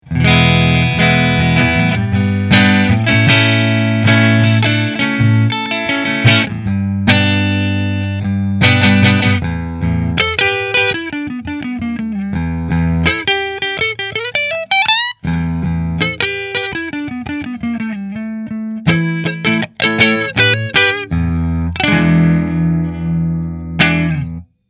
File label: Rahan Red Flame Maple Neck Fender, guitars